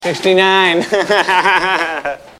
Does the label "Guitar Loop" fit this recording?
No